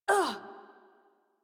Generic Short Pain Operator Voice; Warframe; Operator Mission

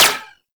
Uzi Layer; Warframe; Tenno; Prime Tenno Uzi

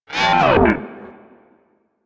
Leech Attack Spotted, Dialog; Leech Osprey

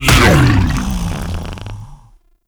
Consume Impact D; Warframe; Grendel

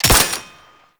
Belt Fed Rifle Low Clip C; Warframe; Tenno; Frumentarius

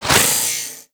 Worker Grinder Whoosh; Warframe; Grineer

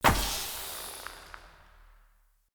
Energy Blade Projectile; Weapons; Swords

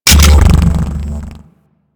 Dog Tag Pickup; Warframe; Syndicates; Dog Tags

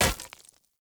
Short Sword Medium Impact Rock; Player; Environment

